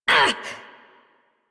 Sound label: Pain Short, Operator Voice; Warframe; New War